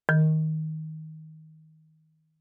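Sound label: Marimba cord fortissimo Eb4; MIS Pitches - 2014; Percussion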